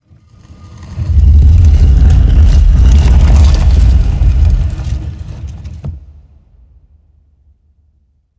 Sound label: Secret Door Open